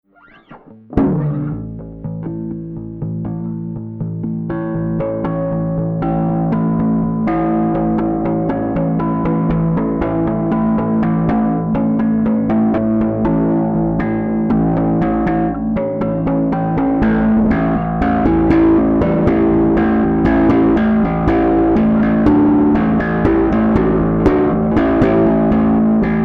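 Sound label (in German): Rickenbacker DRIVE 3 Uhr TONE 0 LEVEL und CLEAN mitte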